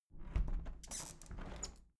window plastic squeak close soft sequence

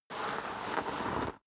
step snow slide long

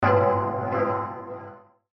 gong

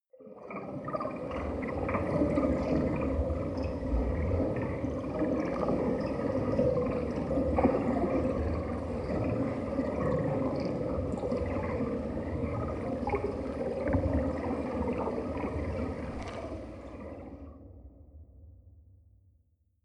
water fx

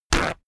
paper towel roll sheet tear off fast

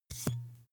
glass bottle handstroke hard long

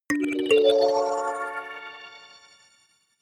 notification kalimba magical fifth up

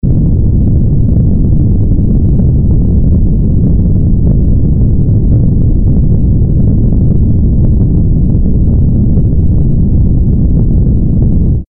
rumbling noisy deep

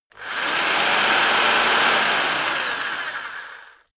rock drill rev reverse very long

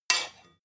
sword blade rattle short

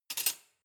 cutlery large drawer place soft

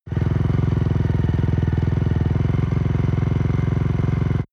motorcycle motorcross idle hot engine

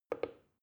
plastic button start click soft fast

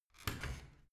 washing machine open

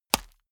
small punch hard

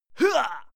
male fight attack hit

